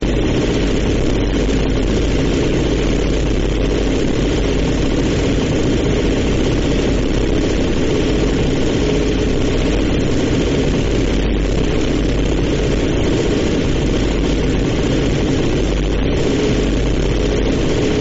vine boom sound spam

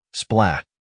splat; crab

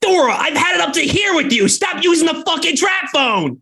phone

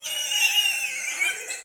alien screech

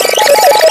scratch

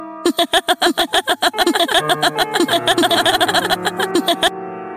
hahahahhahzahahahahahahahahah

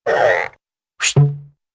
stretch whoosh and thunk

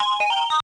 ding ding ding sound effect

